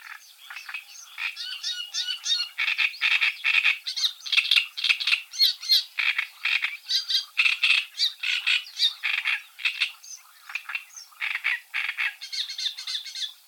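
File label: kamyshovka drozdovidnaia, great reed warbler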